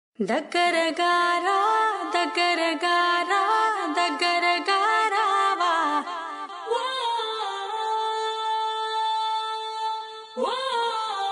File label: jingle; Close Up